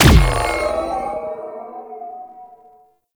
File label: Overguard Pulse Repeat B; Warframe; Infested; Ancient; Protector Ancient